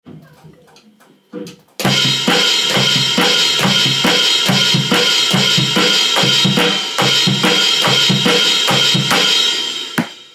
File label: rock beat